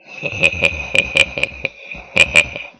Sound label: hehehehehe